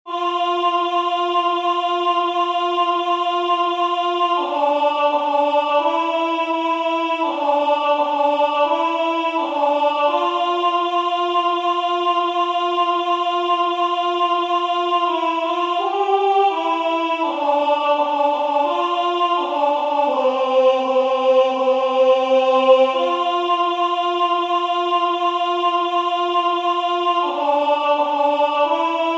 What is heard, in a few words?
Alto; Signum